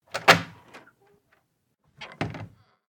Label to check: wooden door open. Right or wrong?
Right